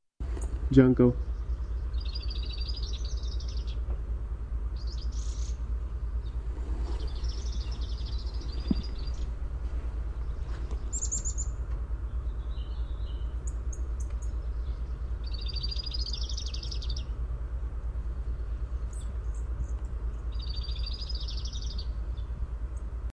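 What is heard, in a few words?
dark-eyed junco song, Junco hyemalis; Passeriformes Emberizidae; Stout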